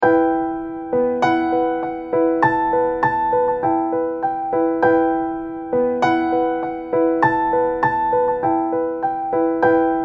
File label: Piano